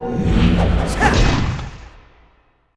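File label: sorcerer attack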